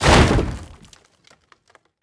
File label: Hiding Spot Destruction Empty; The Inn